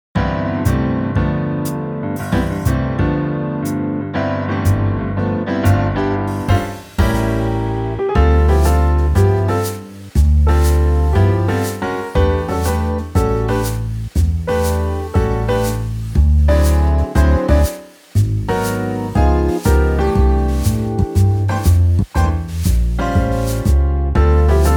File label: Jazz